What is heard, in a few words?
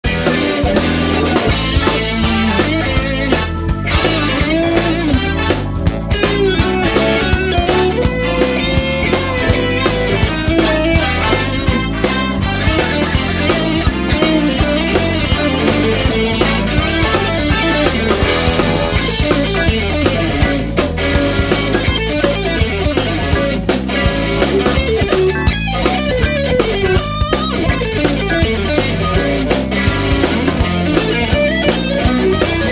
Uptempo Blues w. Horns